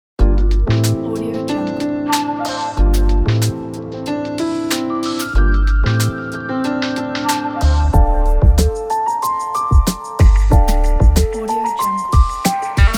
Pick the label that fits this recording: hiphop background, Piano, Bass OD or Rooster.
hiphop background